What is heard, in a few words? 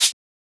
Shaker; FLPRO